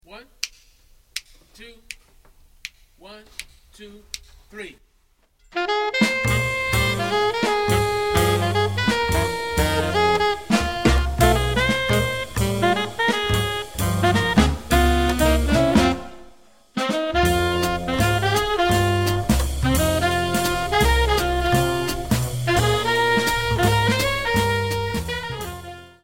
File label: minus Piano; count off sets up the intro; play the intro and melody as written; solo 4 choruses; comp for [1] and tenor solo